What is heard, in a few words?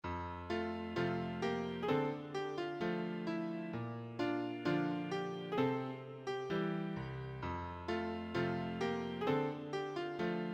There's a safe place piano acc; church and sunday school music; Hymns